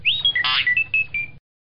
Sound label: robot